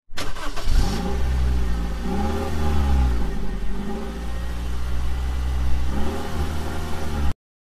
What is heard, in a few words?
start